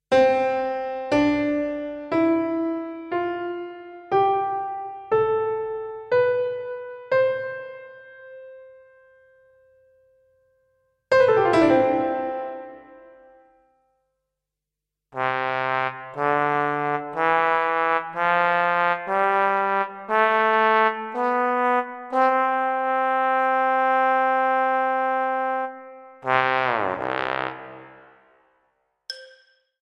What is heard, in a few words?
Slow Scales